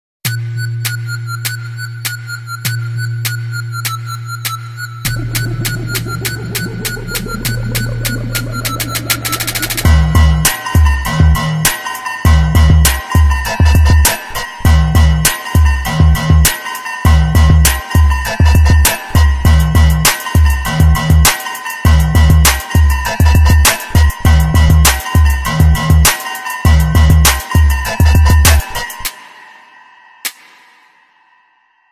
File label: villain entry theme music; villain intro bgm; villain entry bgm ringtone; mafia bgm ringtone